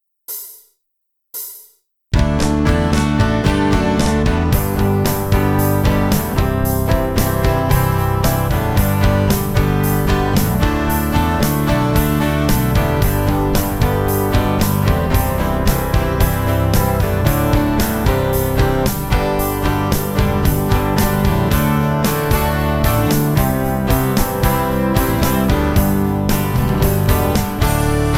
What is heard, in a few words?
melody; smorgasbord